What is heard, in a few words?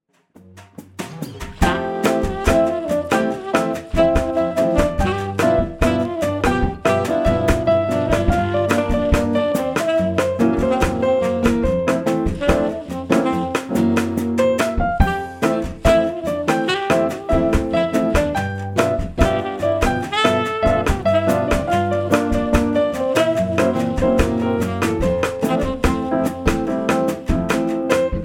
Sax